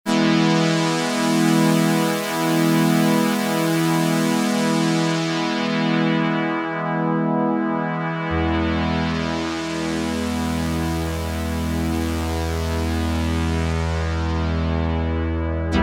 FILTER DRONE, Oberheim OB-8; Factory Patches

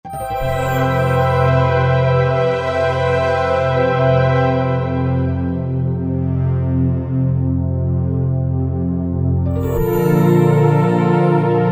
Sonar Bell String, Korg Wavestation A-D

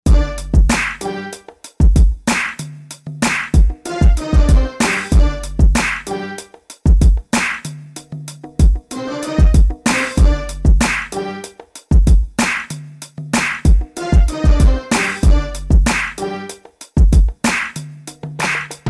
AKAI XR20 Factory patterns; ROKIT

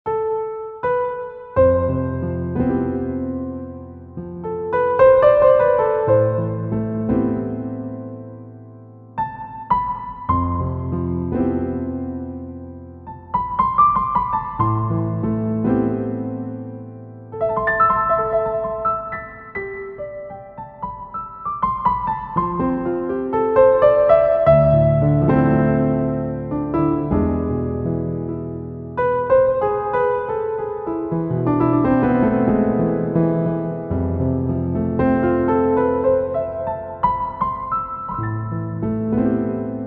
Grand Piano; Sonic Reality Modern Songwriter

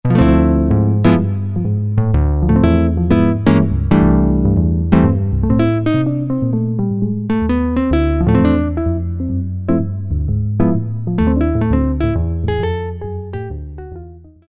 JazzGuitar, Roland MKS-50; Factory examples

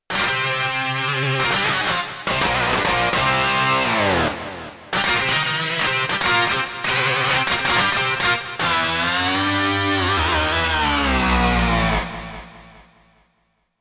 Big Guitar, Roland D-70; Factory Performances

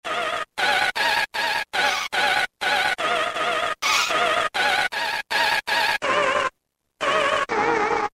Vinyl Opera; Roland M-DC1

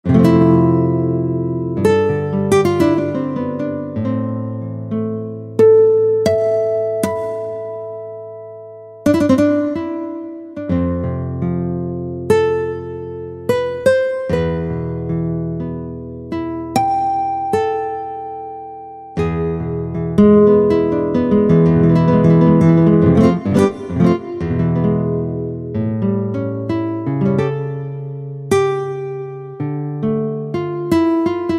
Nylon Guitar, Yamaha PSR-3000; Factory Voices; Guitar & Bass

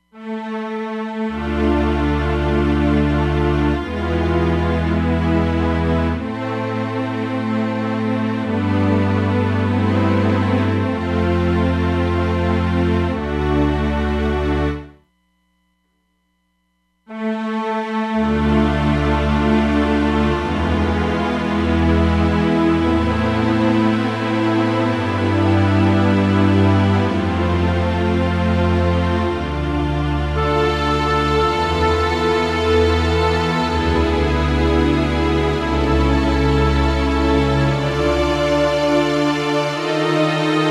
Concert Hall, Roland DEP-5; Factory Patches

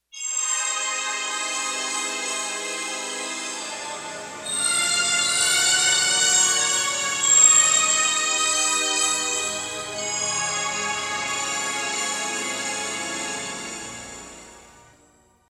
Spacer

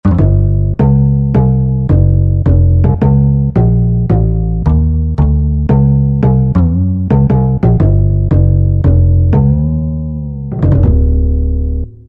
A. Bass, Korg M1; Factory preset examples

